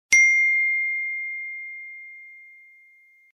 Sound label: ding